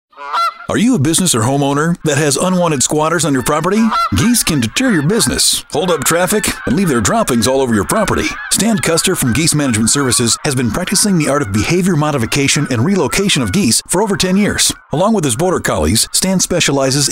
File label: Geese Squatters; Geese Management Service